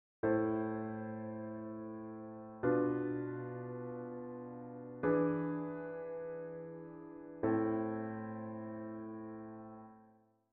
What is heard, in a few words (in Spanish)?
cadencia